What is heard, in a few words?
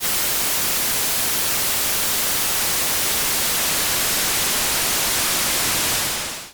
static